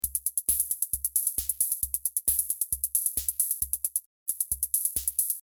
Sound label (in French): old school boite a rythme; Loop Mania Sampler Pack